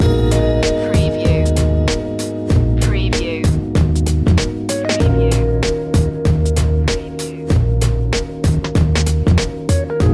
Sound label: cool check loop